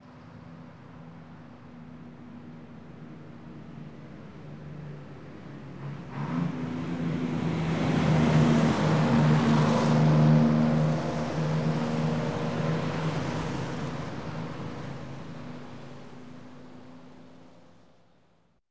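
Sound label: sounds of cars, traffic jams and car traffic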